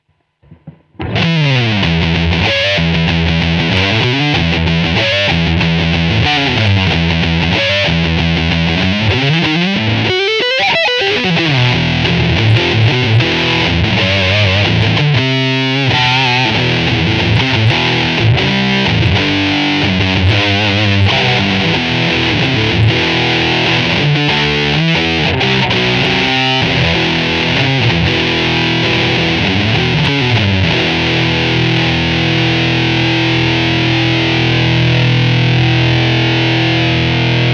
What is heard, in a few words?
Dirty Metal Riff